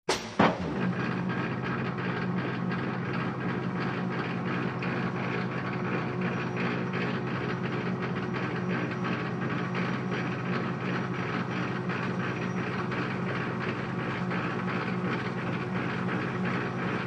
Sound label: Crane Motor Movement, Cu Perspective, W Strained Engine Noise.